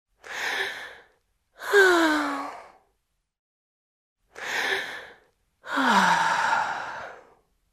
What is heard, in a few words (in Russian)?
vzdoh zhenschiny